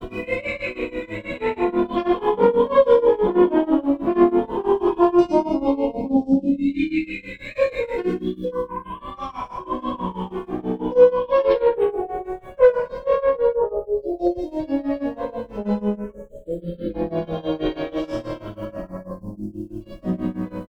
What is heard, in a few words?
Jazz horn